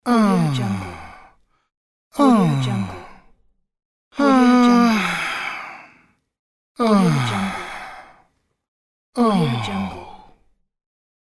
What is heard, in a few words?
man disappointed sad pack; medusamusiclab